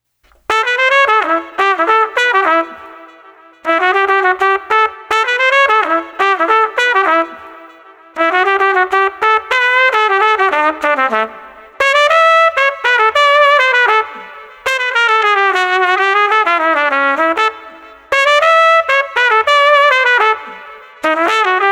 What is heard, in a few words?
Street Trumpet Swinging 100